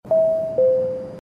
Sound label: Bing Bong